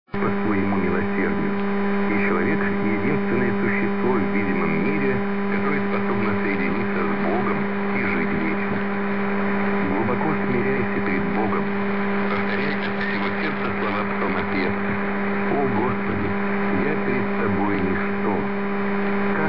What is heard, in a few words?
11.815 MHz Notch off